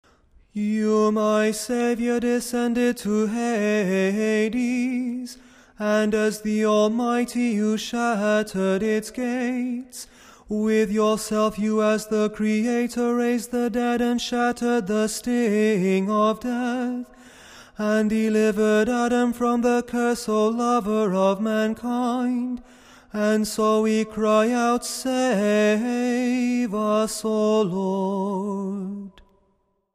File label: Tone 6 kontakion